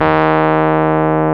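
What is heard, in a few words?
piano Rhodes Mk.V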